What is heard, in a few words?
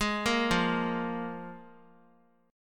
F Minor Double Flat 5th; Fmbb5 arpeggiated; JGuitar